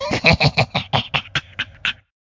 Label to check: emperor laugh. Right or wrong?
Right